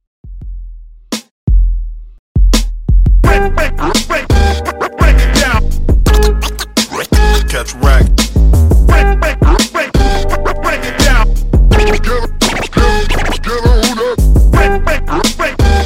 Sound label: Scratch Dj Intro Outro 85Bpm